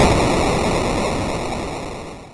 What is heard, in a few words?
Retro Explosion